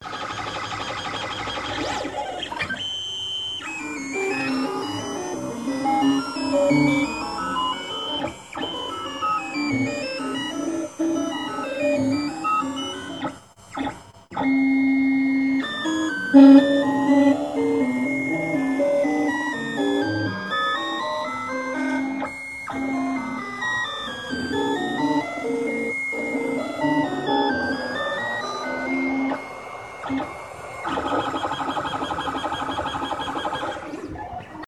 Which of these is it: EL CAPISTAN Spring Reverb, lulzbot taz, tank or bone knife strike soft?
lulzbot taz